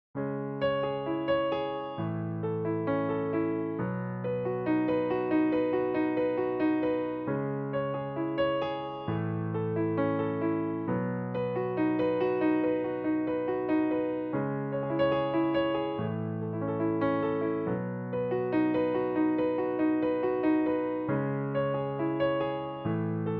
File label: guitar solo